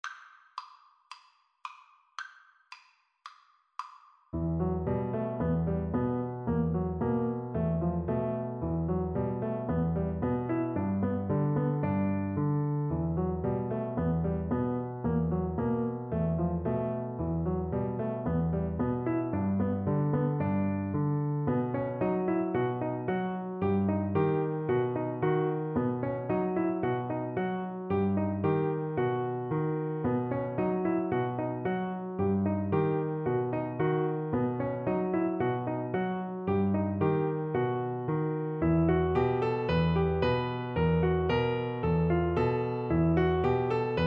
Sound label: grieg mountain king, oboe